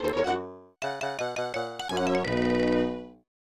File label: Lose Life; The Magical Quest Starring Mickey Mouse; SNES